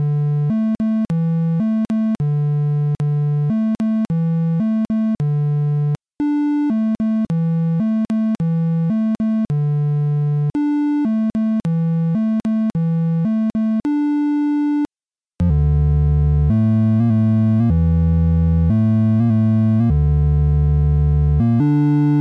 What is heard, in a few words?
melody